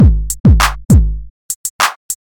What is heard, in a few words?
100 Crisp Classic Drums